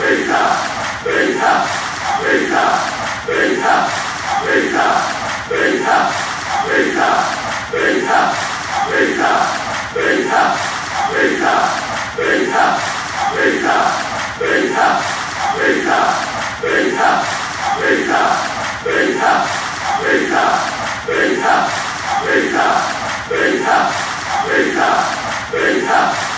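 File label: pisa chants, football songs and soccer chants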